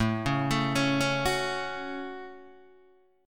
A 6th, Guitar, DADGAD; 7,4,7,6,4,4

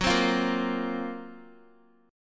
F sharp Major 7th Flat 5th, Guitar; strummed; JGuitar

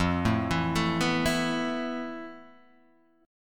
E Suspended 4th F; Esus4/F arpeggiated

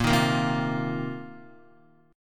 A sharp Suspended 2nd Suspended 4th down Guitar; strummed; A#sus2sus4; JGuitar

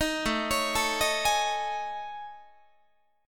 A Major 7th Flat 5th, down Guitar; AM7b5; arpeggiated; 18,17,16,19,19,19

